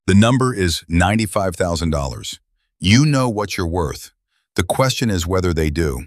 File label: briefing beat; lowball offer